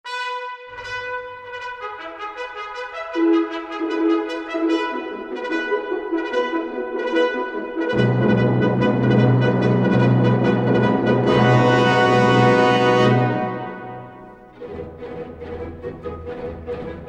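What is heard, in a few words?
Horse Race Instrumental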